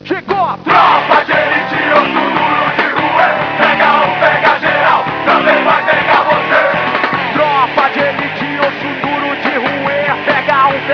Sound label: tropa musica loop; cs rio tropa